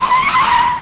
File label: CAR SKID; DXM EarShot